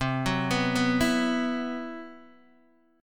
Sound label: C Major 7th Flat 5th C, Guitar Open D